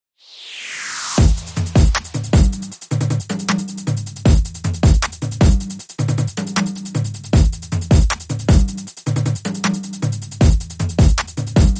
Beat

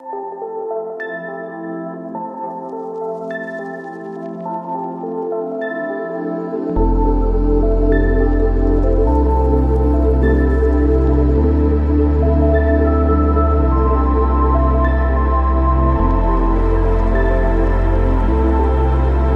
Ambient Atmospheres

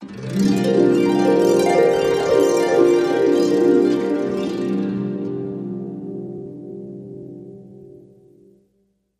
Harptastic

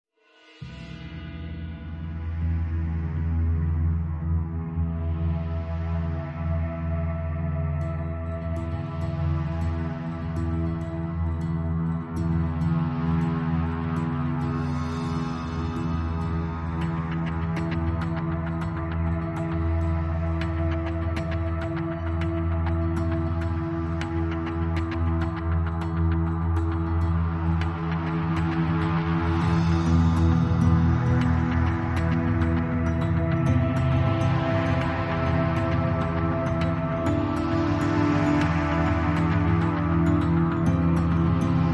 Reduced Drums Reduced Arrangement

